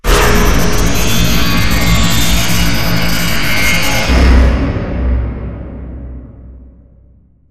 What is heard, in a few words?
Assembly Robot Arm Scanner; Boomtown; Titanfall 2